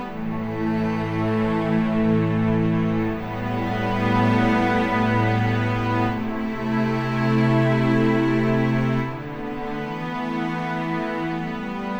Cello ens; Сэмпл виолончели; Cello Ensemble A; film music; Strings; виолончель; Movie Score; 80BPM; Музыка для фильмов

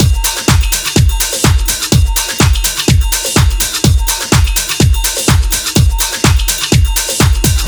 Groove King, диско-хаус

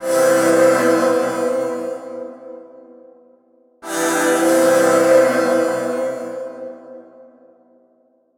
Synthetic Choir

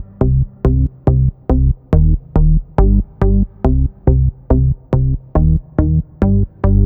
Trance Bass; Бас сэмпл (техно, транс); Techno Trance; 140BPM; loop; samples

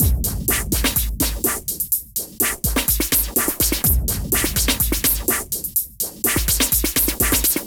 Ultra high envelope; Big Beat

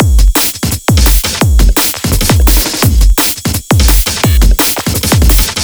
Percy Beat A, 170 bpm

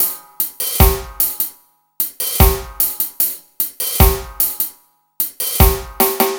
Clear Drums

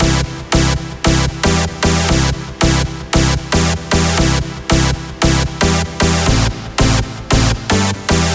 Chord Punch

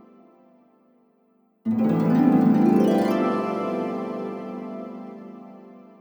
Harp

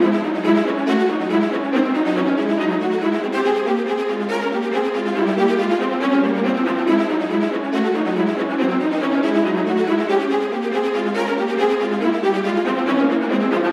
viola; виола

